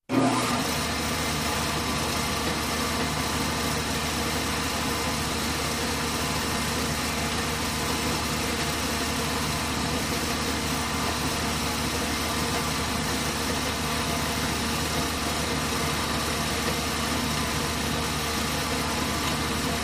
Motorized Shop Tool, Joiner ( Wood ); Turn On Stead; Turn On Steady, And Off With Wind Down.